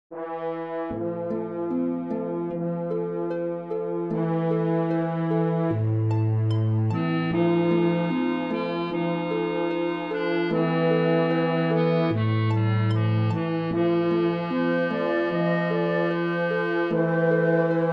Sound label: D minor Nocturne Orchestral